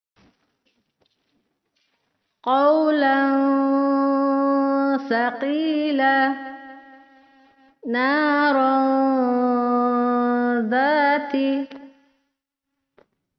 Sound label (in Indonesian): Perekaman baru Karaoke denoised; dengung ghunnah; tanwin tidak sejajar